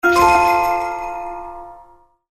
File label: Lantern explosion